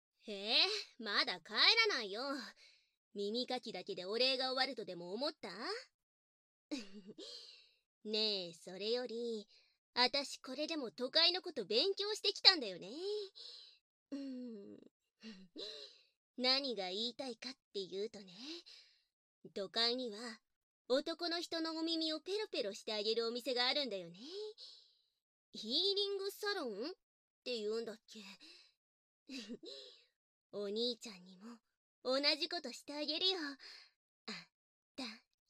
耳を舐めて, バイノーラルマイク収録; 従妹に耳を舐めてもらおう！; 効果音あり; 効果音小差分

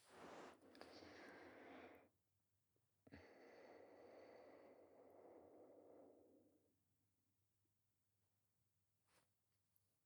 寝息のみ(左)

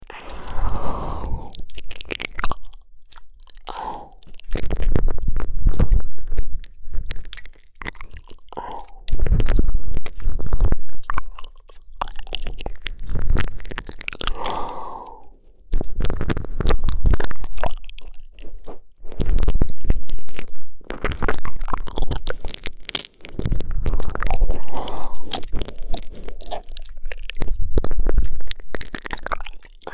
超・超!! ぐっぽり耳舐め!!; 生意気ドスケベ家出ギャルJKと子作りえっち！?

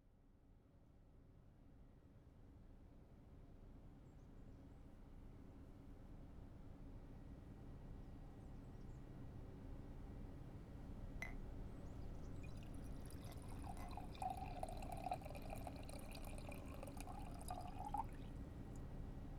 蒸気火熨斗 も 書けねば ならぬ の（すちいむあいろん、霧吹き) ASMR

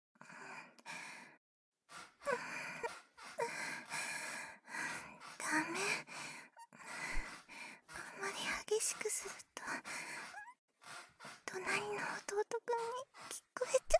壁越しに聞く夫婦の営み（バイノーラルマイク 効果音あり大きめ）

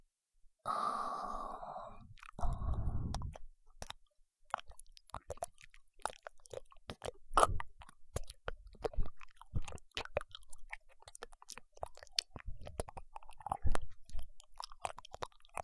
両耳舐めループ

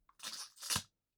オナホからペニスを出す; アダルト系

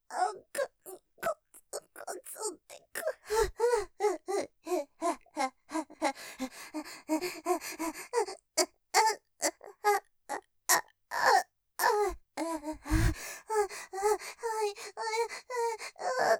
絶頂寸前 奥、こつっと来る 断続ピストン; ステレオ

